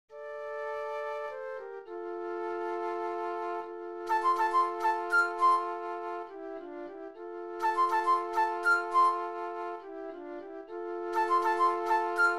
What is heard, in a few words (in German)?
Morgens um 7 - Floete Solo